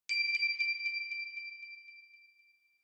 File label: Bell Accent High Delay